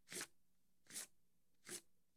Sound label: Cards Slide From Shoe